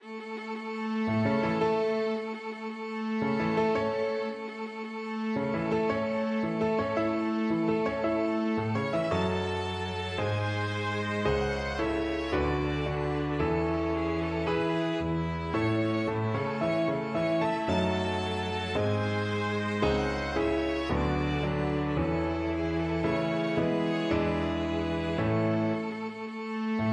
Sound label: wedding march, piano quartet; mendelssohn